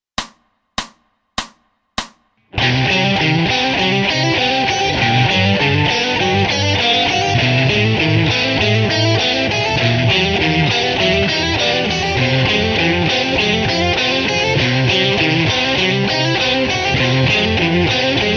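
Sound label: Arp Etude Chromatic Embellishment fast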